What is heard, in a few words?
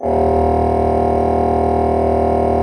D1, Cathedral Organ; ILIO; Propeller Islands